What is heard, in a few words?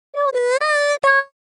logout